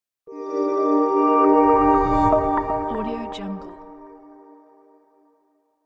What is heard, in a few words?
light logo reveal abstract